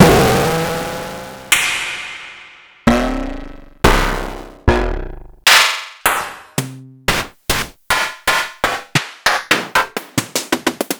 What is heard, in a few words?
Snare c